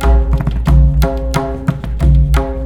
MRIDA, Indian Traditions; USB Soundscan vol.45; AKAI

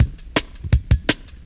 Tin Cut, Jungle, Drum Loops 165.5; Zero-G Jungle Warfare 1, 2 & 3

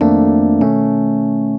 CHORD, Drum & Bass, 150 BPM

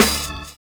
FILL; Univers Sons - Kitch 70s

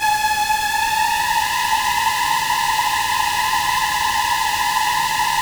HORNETS; Distored Reality

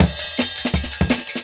Burp, Jungle Breaks; Original Jungle Breaks

